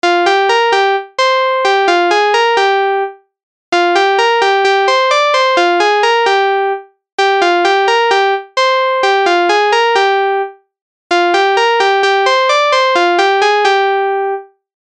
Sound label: Creating a lead sound